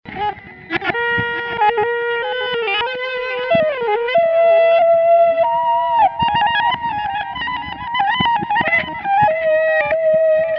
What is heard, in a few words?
Reverse Delay; Modern simulation of reverse delay